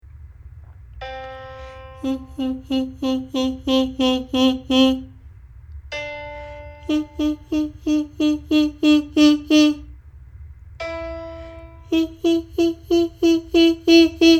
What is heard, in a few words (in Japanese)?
「ヒ」声量コントロール